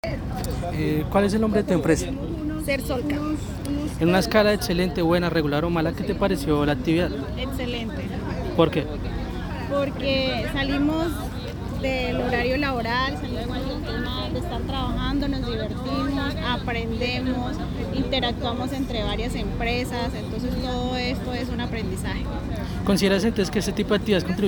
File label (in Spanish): Impacto